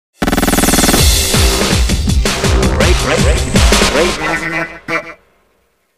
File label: jingle